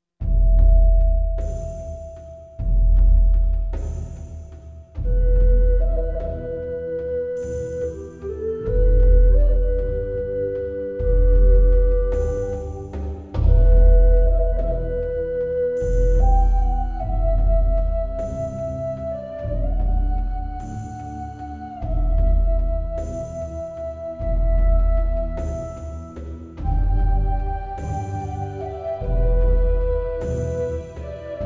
tenor ocarina

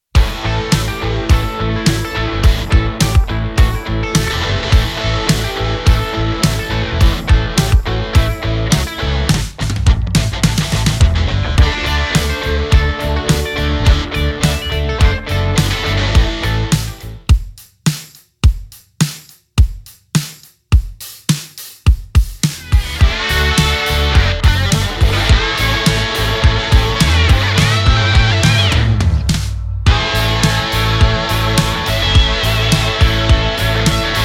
rock

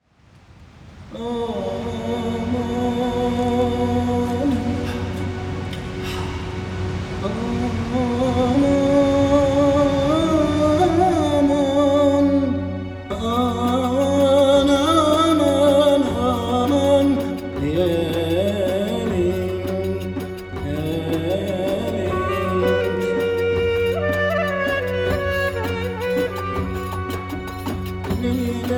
Desert Storm

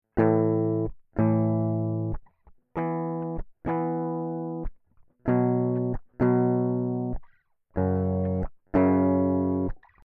waves

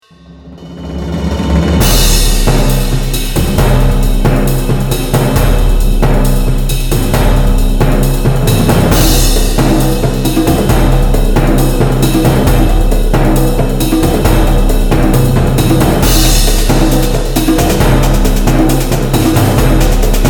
Bomba

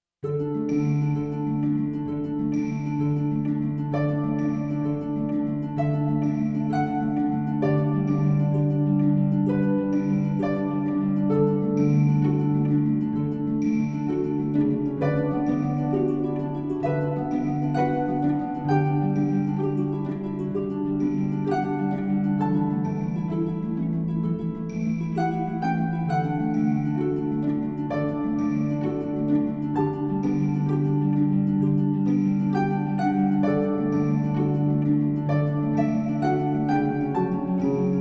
celtic harp

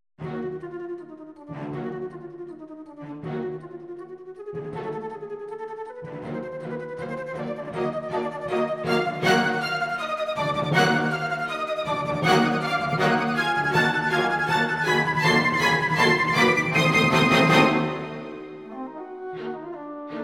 alto

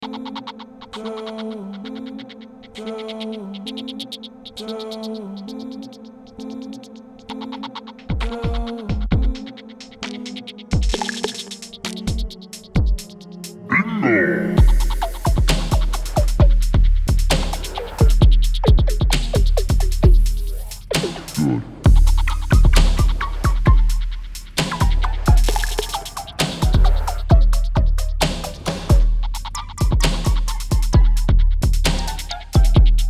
trap bypassed

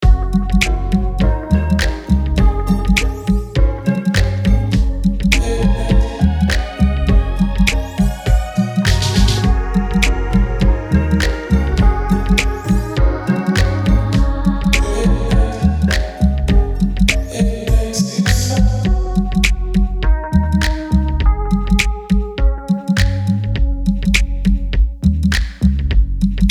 r b active

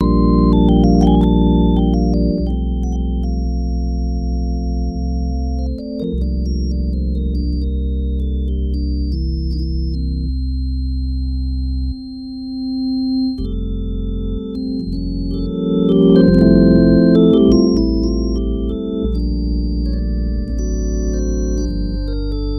slow organ dry

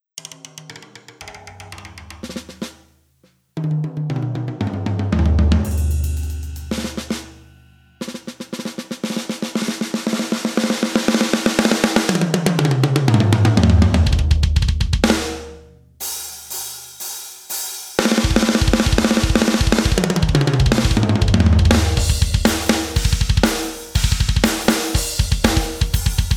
Kit Check Snare Medium, Felt Kick

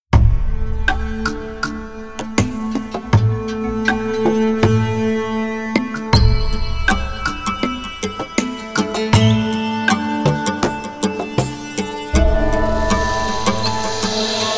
Indian Fair; Fractured

